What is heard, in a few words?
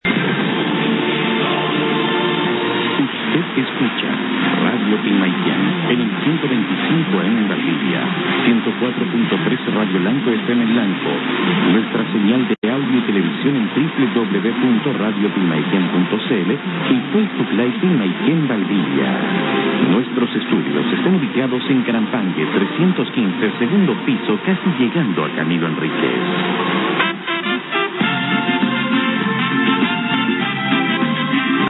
Chile 1250 Radio Pilmaiquen; bariloche